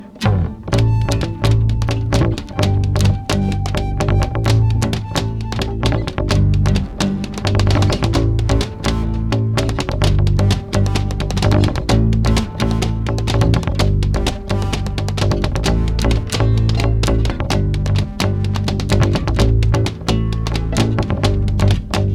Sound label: pounding insistence